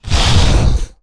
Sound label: Sniff; Evolve; Goliath